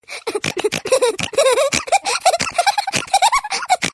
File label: Baby Laugh